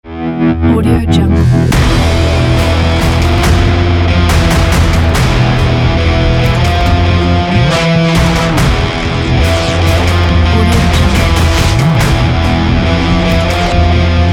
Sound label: war sport rock trailer